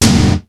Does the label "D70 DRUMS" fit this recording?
Yes